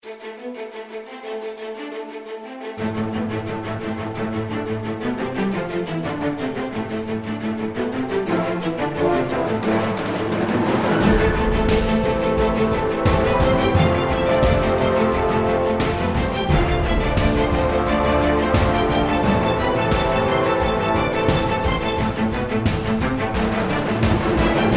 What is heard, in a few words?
orchestral variation; Mega Fighting Bots; Poptropica